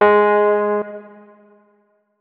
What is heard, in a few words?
notes, keyboard, electric piano